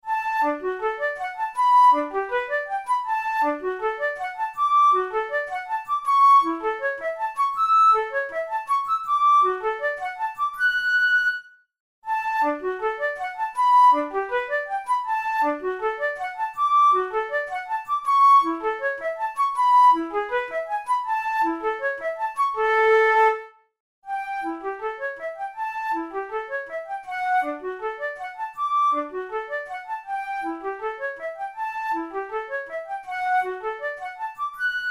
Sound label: studies for the boehm flute; drouet 72